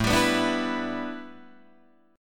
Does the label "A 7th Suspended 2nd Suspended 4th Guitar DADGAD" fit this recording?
Yes